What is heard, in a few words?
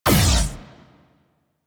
Gatling Laser Shot; Railjack